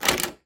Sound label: door open